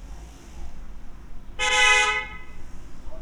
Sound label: Frustrated Car Mild